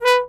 Trombone staccato B3; Orchestral; DirectWave; Varazdin Orchestral

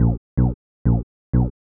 HOUSE EXTRA, 125

